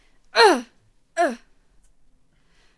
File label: Bomb Thrower Death Vocals